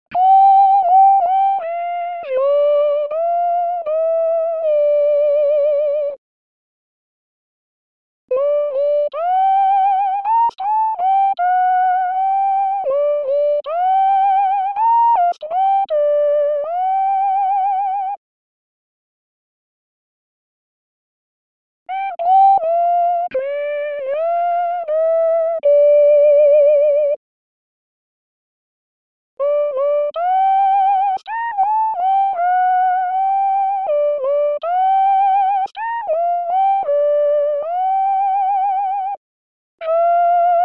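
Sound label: bass; di Lasso; Quand mon mary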